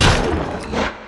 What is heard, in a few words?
Pain